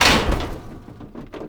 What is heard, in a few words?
Military Checkpoint Wall Destroy; Warframe; Ambience; Nineteen Ninety Nine